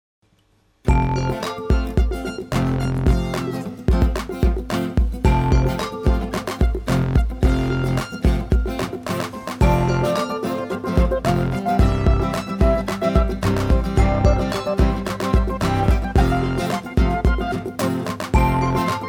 happy birthday karaoke

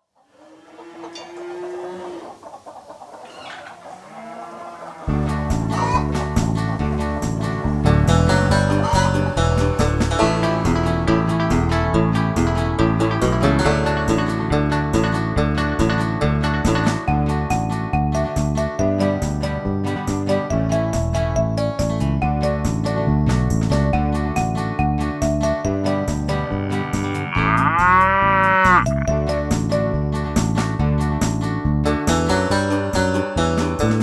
I'm a farmer karaoke

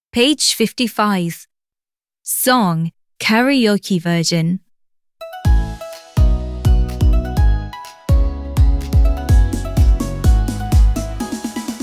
song karaoke

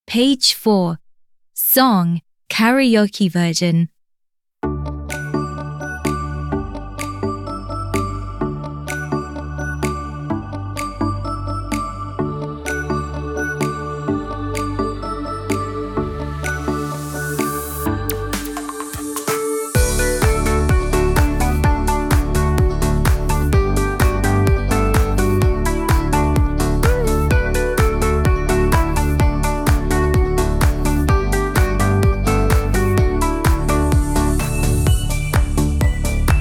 song karaoke

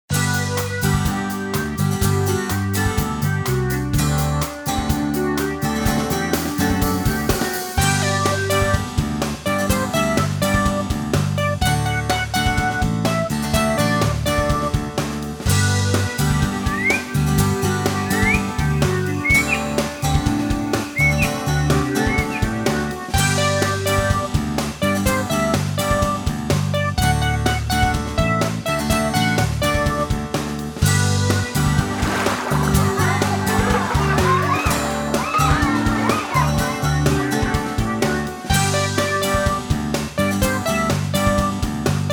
karaoke

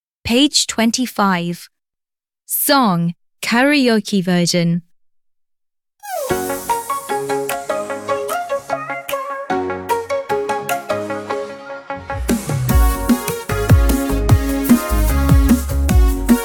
song karaoke; happytoons; happytoons edycja polska; szkola podstawowa

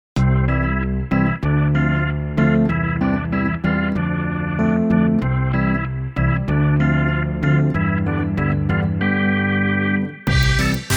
where's the rabbit karaoke

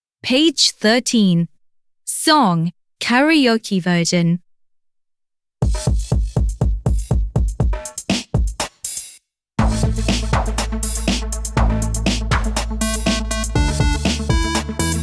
song karaoke; happytoons; happytoons edycja polska; szkola podstawowa

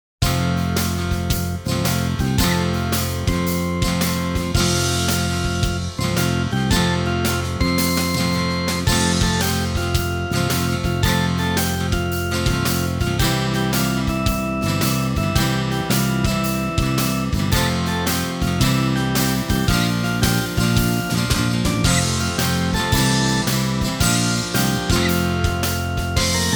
this is my house karaoke

